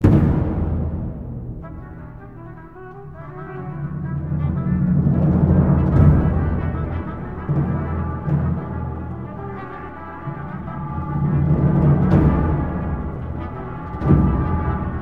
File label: Combat loop; The Banner Saga 1 & 2